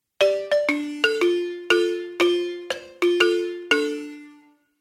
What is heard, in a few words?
Balafon